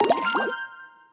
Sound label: win multiline; Hungry Shark; Wazdan